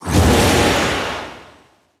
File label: charge; haunt